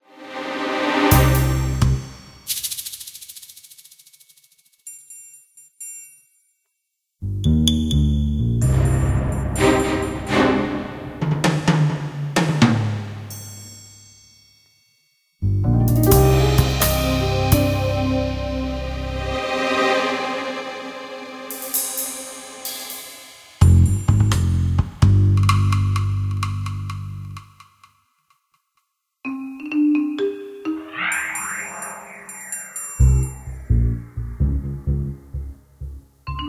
Ambience; James Bond 007 Nightfire (PC); Hidden Agenda

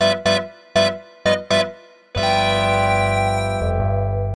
Small Intro; Paper Mario - The Thousand-Year Door (GC DSP)

